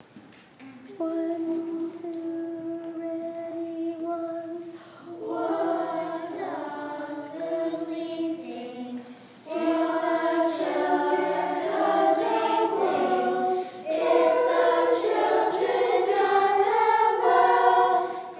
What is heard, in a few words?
peace round old english canon